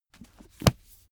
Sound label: heavy book shut